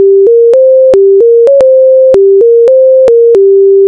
Fast song

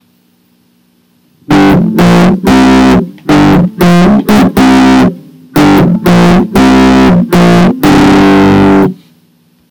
distorted

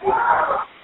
Scream

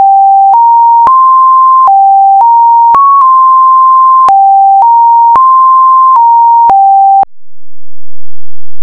Highpitch

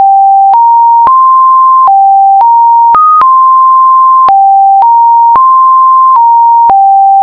(modified)

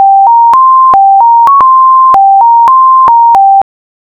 2x fast

